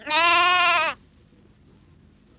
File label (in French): Agneau